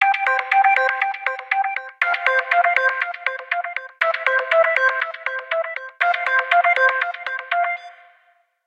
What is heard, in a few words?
Alarm Matrix; Samsung Z2; Ringtones